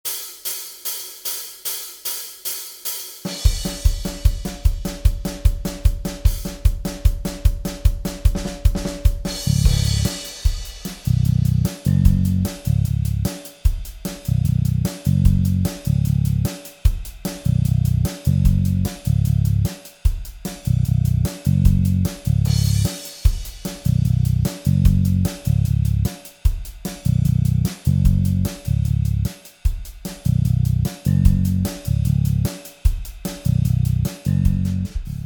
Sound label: backing track